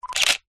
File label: technology camera dslr shutter take picture nikon d5200